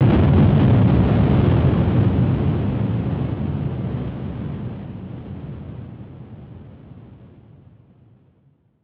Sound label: burst